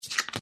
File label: card Flip